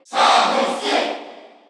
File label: Cheer Korean; Samus; SSBU